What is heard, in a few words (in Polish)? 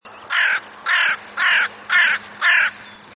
wrona, krukowate - corvidae; posłuchaj moich własnych nagrań wron